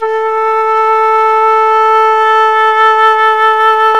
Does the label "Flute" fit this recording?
Yes